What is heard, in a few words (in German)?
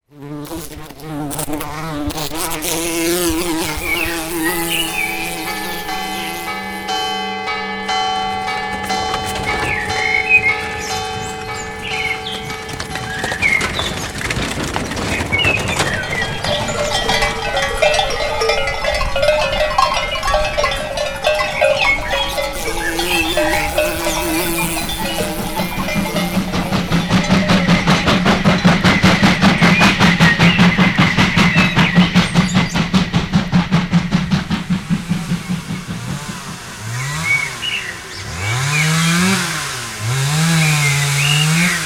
Der Sound der Umgebung Klangcollage; Umweltkonzentrat